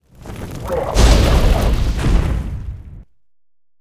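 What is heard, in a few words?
dark archer attack, monster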